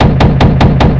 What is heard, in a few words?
automatic gun 2A42 low, weapons; DCS